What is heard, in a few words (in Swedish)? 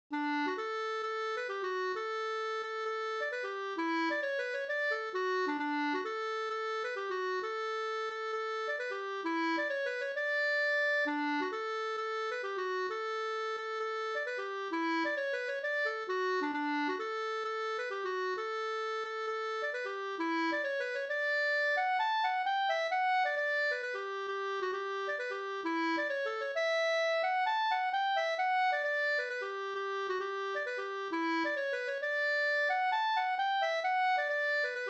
pols Rorospols